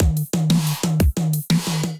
Ocean Kit, Drums; Ableton; Drive and Glow

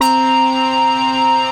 Poli Synth Keys; Belial

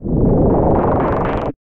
Warble Spaceship, Effects; Instruments; Ableton; Synth Essentials; Operator

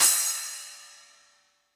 Splash 8 Bright Room, Cymbal; Drum Hits; Standard Hits; Ableton; Factory Packs; Session Drums Studio

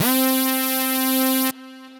Acid, Synth Lead; Age; Ableton; Glitch and Wash

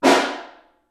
Far, Snare; Ableton Foundation FreePacks; Snare Designer

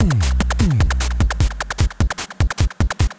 75 Beat, Drum Machines; Fire Bedstuy; Ableton

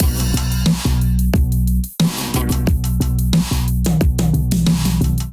Ocean Kit Melodic 90 bpm, Drums; MIDI Clips; Drive and Glow; Ableton; Factory Packs

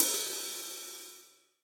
Hihat Multirod Open Dry Acoustic; Drum Hits; Standard Hits; Ableton; Factory Packs; Session Drums Studio

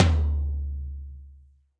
Tom Low Old School; Drums; Drum Hits; Ableton; Session Drums Studio; MPE

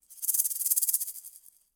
Maracas FX; Latin Percussion; Drums; Drum Hits; Shaker; Ableton; Factory Packs

